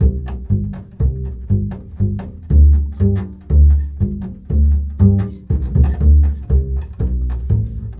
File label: Bull Fiddle, Appalachian